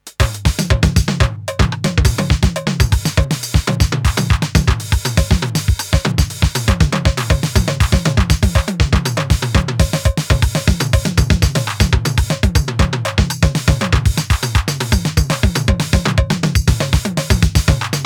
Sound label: tr707